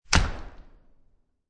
Door Close Wooden And Glass, Household, Door Close, Heavy Wooden And Glass Door; Miscellaneous Door